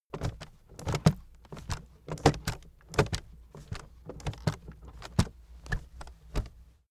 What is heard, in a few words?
aluminium case walk